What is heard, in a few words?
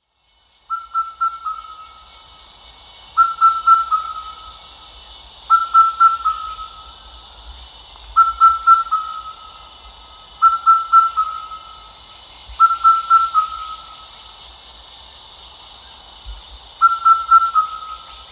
indian cuckoo